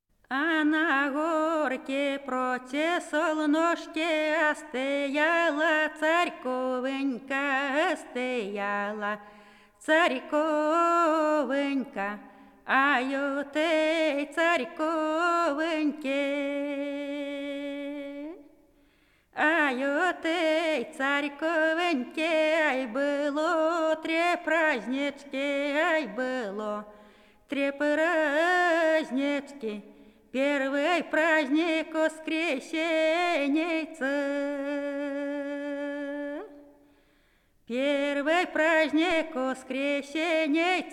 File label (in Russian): А на горке против солнышка; Фольклорный фестиваль имени Ольги Сергеевой